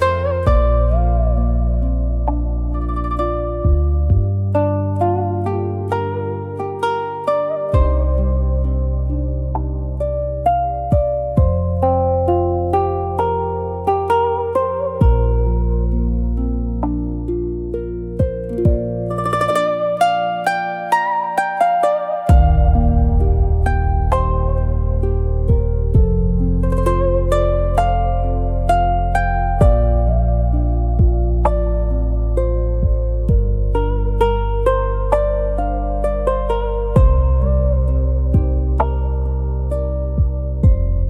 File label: Quiet Strings